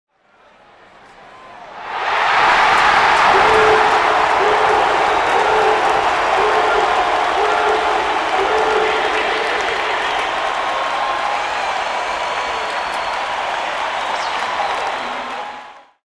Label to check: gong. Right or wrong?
Wrong